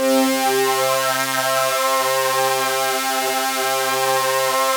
SYN, Analog; Sound & Vision; Gigapack I CD 2

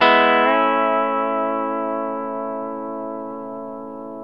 E TO A, Pedal Slides, Earth Tones

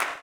CLAP, blues & soul; ILIO; Vocal Planet

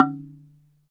Slit Drums, Africa, Wood & Metal

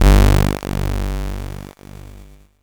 C64 Stop Bass

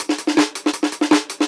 Jungle Breaks; Original Jungle Breaks

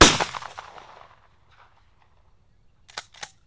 SHOT, Deadly3D; E-MU Producer Series Vol. 5 – 3-D Audio Collection; 3D Percussives

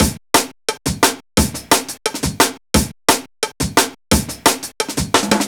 classic dnb breaks, B, 175

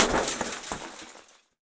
CAR WINDOW; 3D Sound - 3D Keys VOL-1; MEAN 3D

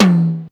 Tom Hi, Ensoniq Mirage

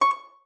STOP C6, harp; Xsample VOL-8; HARP+FX

